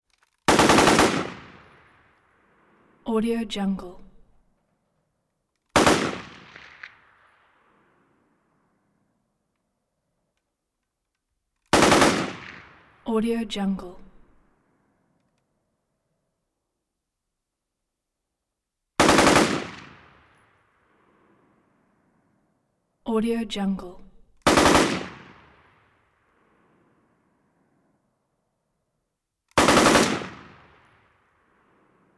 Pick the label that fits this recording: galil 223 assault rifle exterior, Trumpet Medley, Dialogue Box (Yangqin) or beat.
galil 223 assault rifle exterior